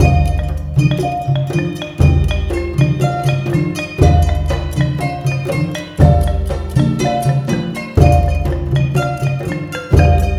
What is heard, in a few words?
MALAY R, Heart of Asia